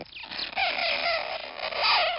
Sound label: green bug squeek, bugsounds